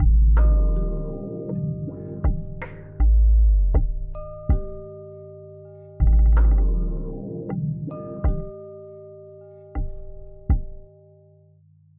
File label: Groove, Tribal Fusion